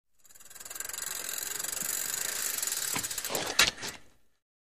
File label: Bicycle Ten Speed Bic